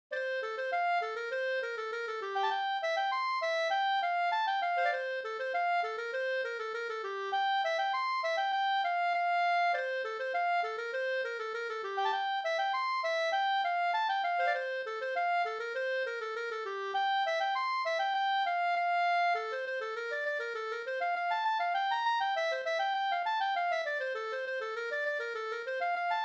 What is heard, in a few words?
polka